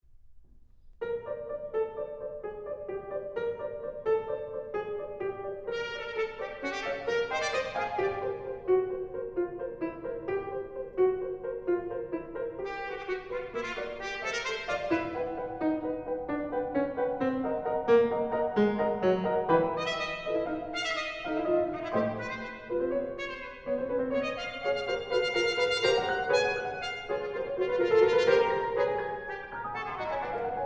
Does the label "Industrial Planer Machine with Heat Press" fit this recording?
No